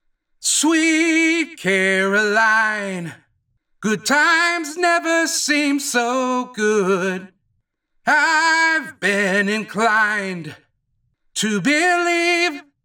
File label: singing waiter